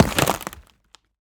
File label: creature wood attack impact; Bluezone Corporation